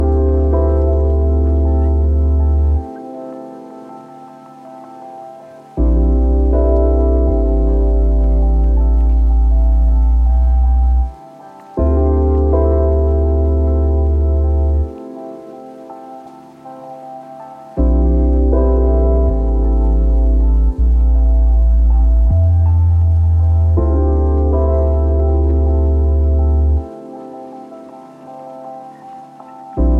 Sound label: bg lofi chill; freepixel; Tilter